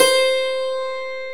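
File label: Hammer Dulcimer C3; Multisamples; Digital Sound Factory Planet Earth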